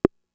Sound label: Conga, Fricke MFB-522